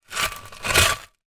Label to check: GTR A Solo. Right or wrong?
Wrong